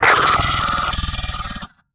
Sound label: Transition Growls Vocal; Adobe; Production Element